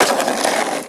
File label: skate; bucky